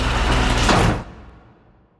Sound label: belt move; eggomatic